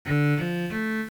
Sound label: Auditory Earcon Open